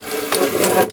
classroom chair drag; Ambience; Warframe; TheNewWar; Zariman

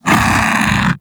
Undazoa Vocal Pain; Warframe; Hunting Critters; Characters

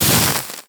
Axe Projectile Impact; Warframe; Weapons; Tenno; Sundial

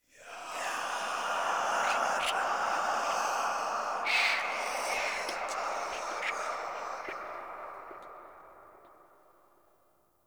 Room Whisper; Ambience; Dante Unbound; Warframe; Entrati; Dead End Secret

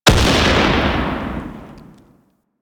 Raptor Mortar Explosion; Warframe

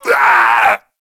Kubrow Feral Grineer Finisher Grineer Male Scream; Warframe

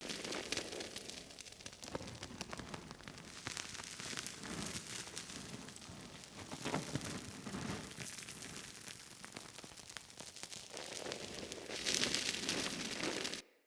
Torch Idle Loop; Weapons; Enemy; Torches; UC Torch

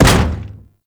Arachnoid Camper Step Default; Warframe; Corpus

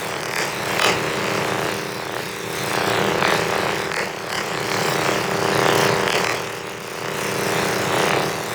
Stun Ability Attach Loop; Warframe; Warframes; Helminth Abilities

